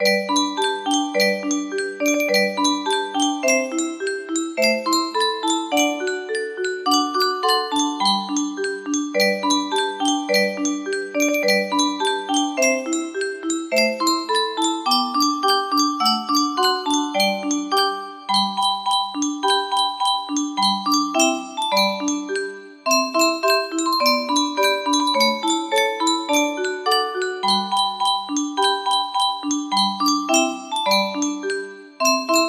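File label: 20 note, music box melody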